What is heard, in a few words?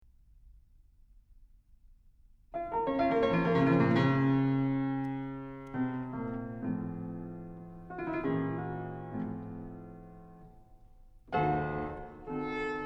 Duo Brillant for clarinet and fortepiano; Karl Gottlieb Reissiger – Duo brillant for Clarinet and Piano in B flat major, Op. 130; The Romantic Clarinet in Germany